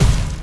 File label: superdash collision; hollow knight